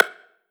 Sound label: Perc Rim Mid, 909 Percs, Vintage Drum Machines; Slate